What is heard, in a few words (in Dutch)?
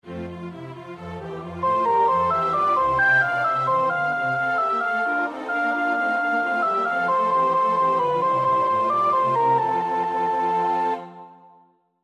sopraan; 19.Ho,ho ho; Purcell (Henry); Dido and Aeneas